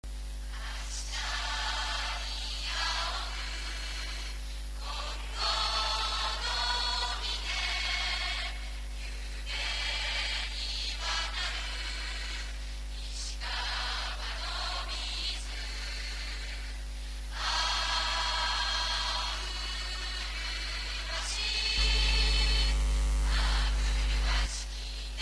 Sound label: chorus